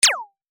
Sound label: pyun beam